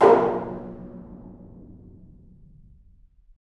Af. Hand Drm, Ambient

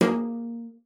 CELLO FINGER A#3; Xsample VOL-5

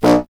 C. Bassoon, solo instruments

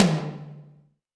Tom Md Media, Alesis D4

